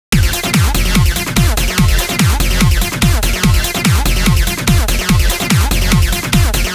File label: Acid BASS Hicut